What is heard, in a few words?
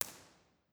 St. Margaret's Church, reverb; ToobAmp